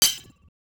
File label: Sword Parry